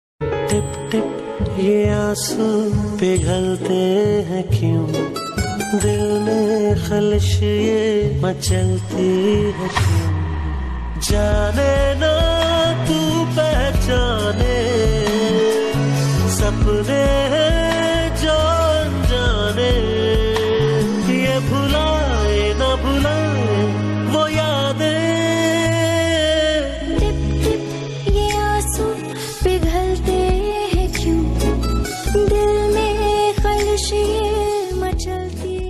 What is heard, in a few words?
OST pakistani ringtone; Ye Dil Mera Ost